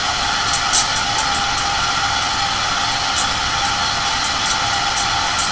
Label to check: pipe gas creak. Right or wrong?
Right